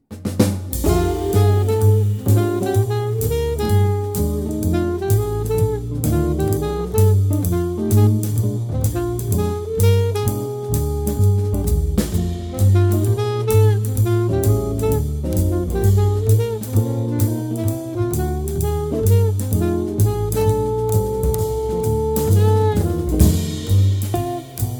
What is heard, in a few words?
Guitar Quartet